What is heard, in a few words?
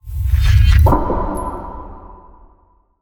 cast sound; casting